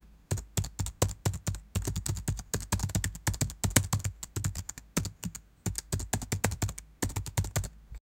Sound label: ui typing